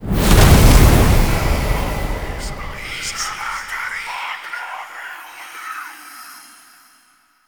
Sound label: Firelight Activate; Ambience; Gameplay; Warframe; Entrati